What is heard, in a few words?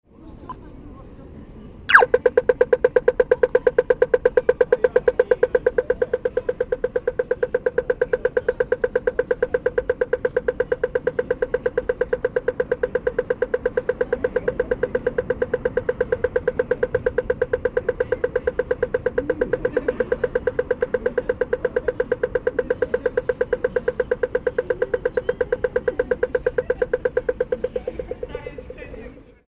traffic light signal noise